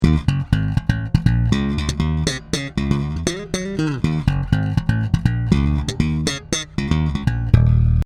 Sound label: both PU aktiv boosted slap, bass